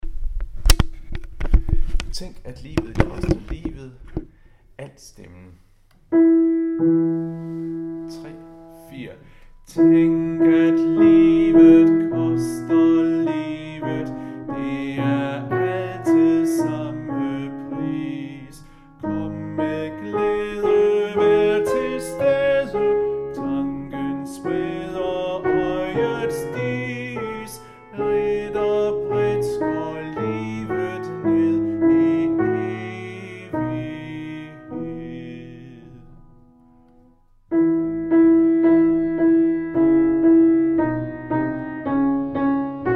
Tænk at livet koster livet alt

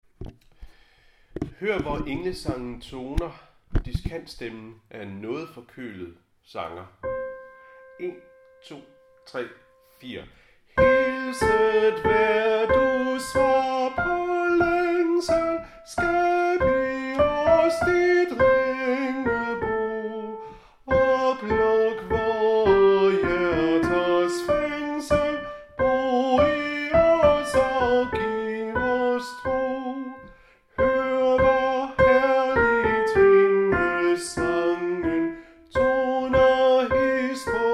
diskant; Hør hvor englesangen

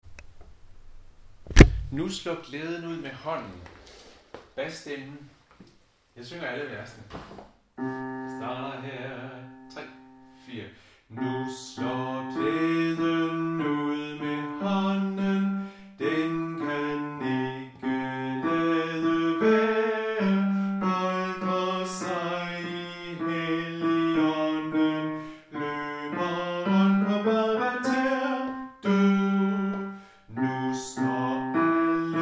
bas; Nu slår glæden ud; harlev koret

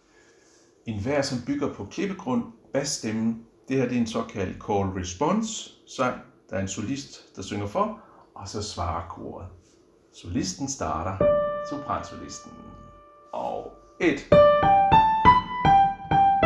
bas

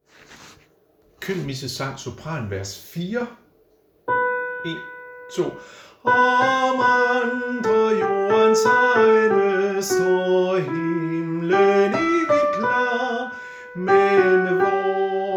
sopran

